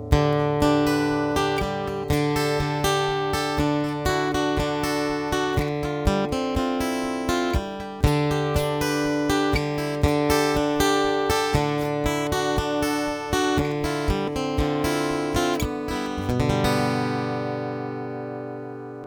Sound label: electro flat